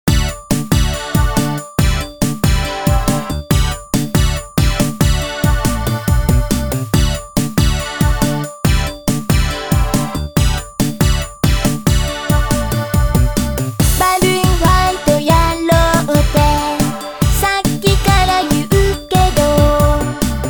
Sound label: Retro Game